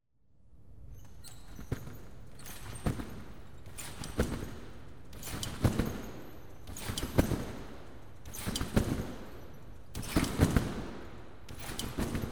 Trampoline - Competition sequence, warm up and 10 display jumps; The BBC Sound Effects Library; Sport and Leisure